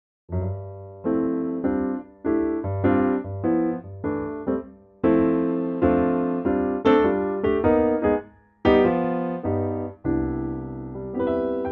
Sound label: C piano; key - C - vocal range - F to G